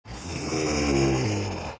big moans; Undying

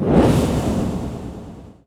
curse tick; weapons; dota; silencer

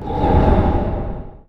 wing, movement; obsidian destroyer

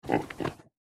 boar snort; npc; courier

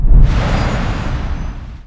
solar bind cast; weapons; dota; keeper

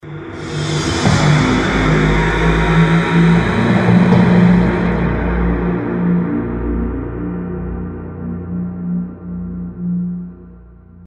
glyph 10 seconds; dota; conquest

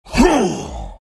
exert; dota; beastmaster

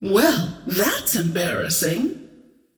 scream whiff; queenofpain

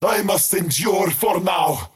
skywrath crown move pain; skywrath mage

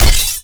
heavy sword impact; weapons; impacts; dota

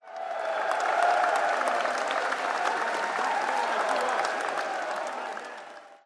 crowd; dota; spectator

